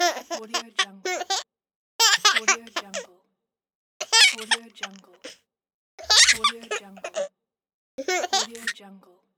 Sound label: baby giggles; absinthemedia